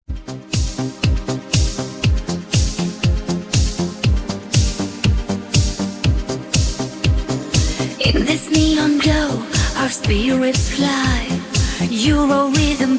italo disco

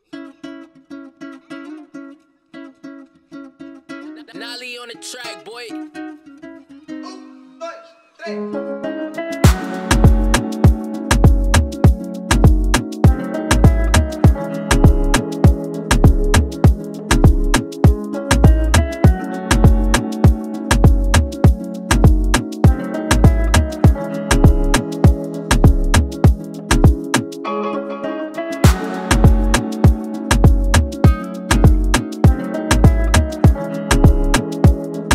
Afrobeat x Latino type beat

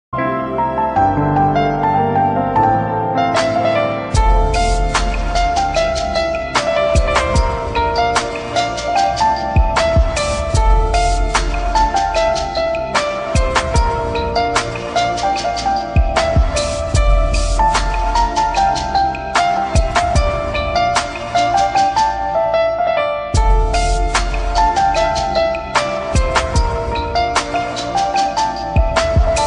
armenian ringtone; haykakan heraxosi zang

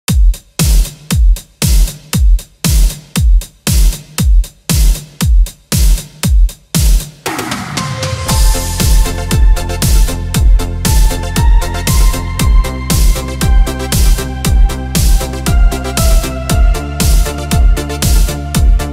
korg italo disco instrumental

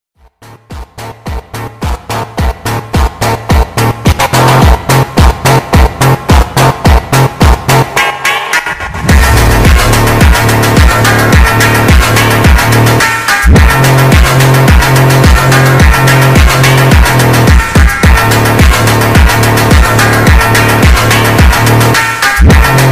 speed up phonk; Trollge Memes

